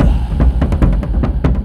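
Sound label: Music Shield; From Dust; ps3; xbox 360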